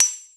put gem

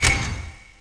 system skill button; UI

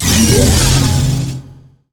Demon

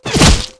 arrow

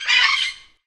damage voice

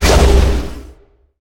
Poison Dagger

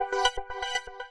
System Skill Key Open

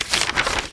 map open

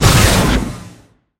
Poison Dagger effect

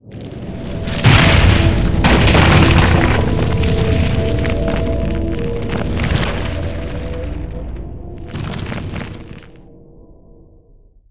trigger down

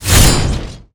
attack; Helroid

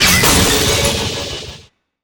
Shield Fracture effect

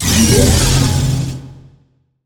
Demon effect

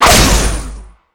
Sudden Strike effect

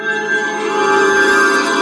buff normal gain

